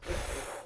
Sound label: Force Push